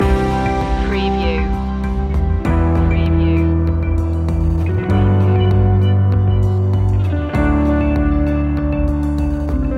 dreamful loop

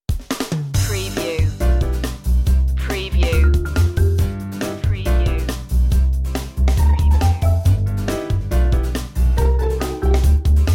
life style tv light comedy

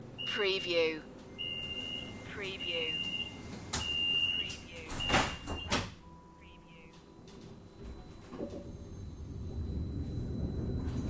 streetcar ride interior loop

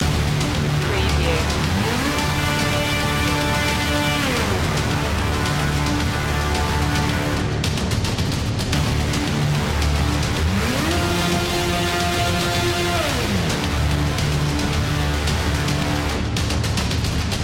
dystopian machine loop

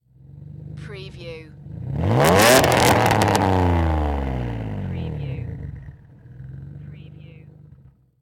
NISSAN 350Z EXHAUST SINGLE REV

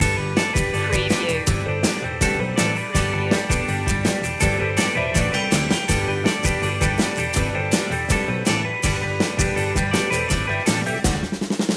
upbeatful loop; Rock / Folk-Rock

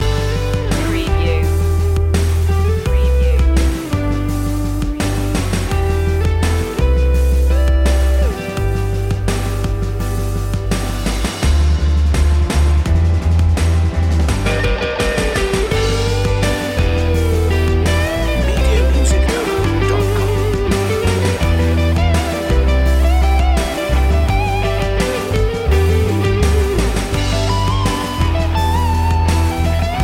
pop anthemic song loop

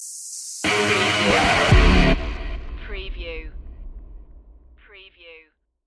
smash and grab stinger

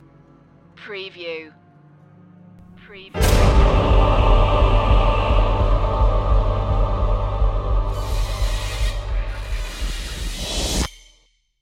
the dark path horror stab

